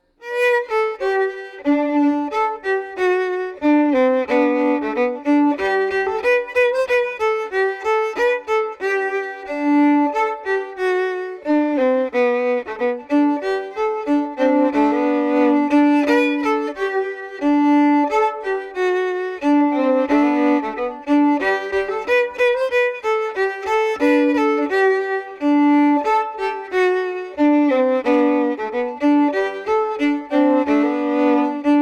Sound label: slangpolska fran sadermanland harmony, fiddle